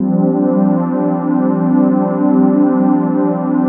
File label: PAD